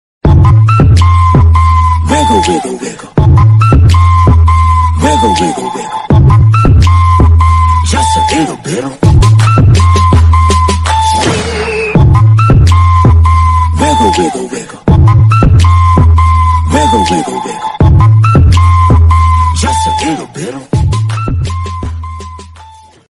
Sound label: bgm ringtone trending ringtone